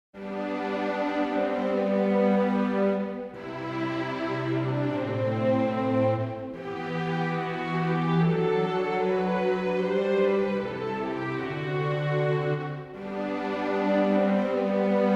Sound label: orch